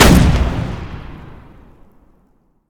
Tank Fire

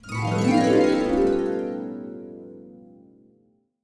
Magical Harp; tinkertown windows gamerip 2020

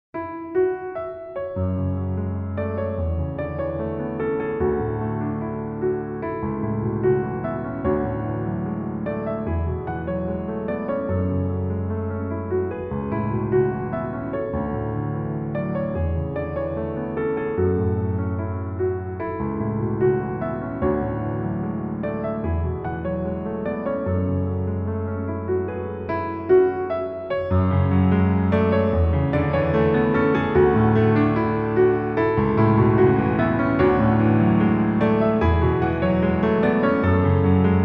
Piano solo; project nimbus official soundtrack

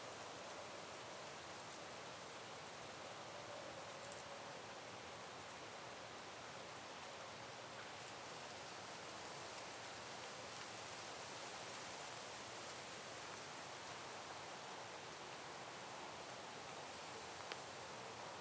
outdoor field

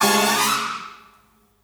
Flashover

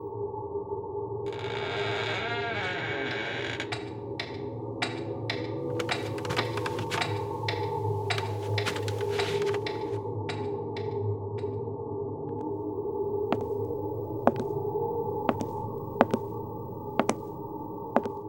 Living room; Toy Odyssey The Lost and Found

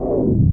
Boss Hit; The Great Empire Allstars Collection 2023

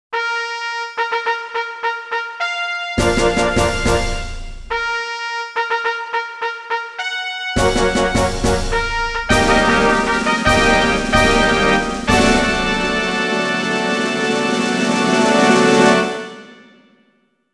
Special Race Fanfare; Tokyo - Nakayama

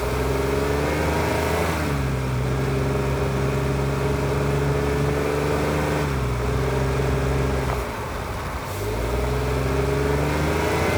vehicle suv drive

